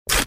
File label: paper glossy rip tear